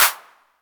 Verby Clap F Key, Royality free clap sound tuned to the F note; single hits one shots